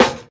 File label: phat snare sound b key